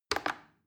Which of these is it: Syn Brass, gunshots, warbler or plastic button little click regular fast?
plastic button little click regular fast